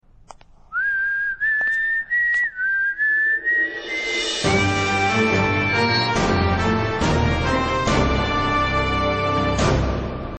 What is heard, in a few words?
Whistle Tone; K B C